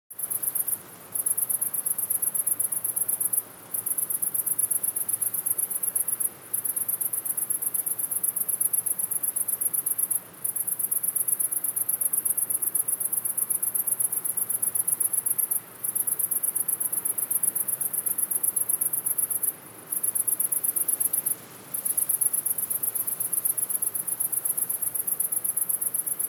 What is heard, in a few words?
crickets wind; animals; best service Blue Box